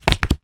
foley photograph handling; zapsplat

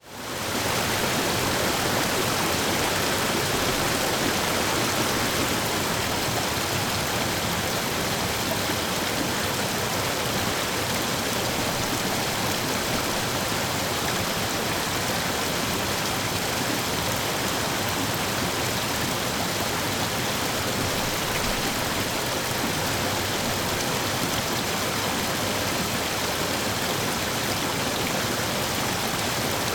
Rapid large river flow (distant perspective); Sound Ex Machina

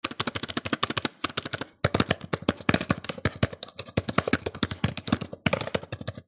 office laptop typing hp stream fast

